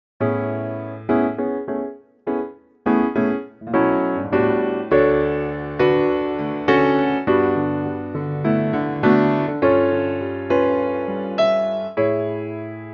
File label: piano A to Bb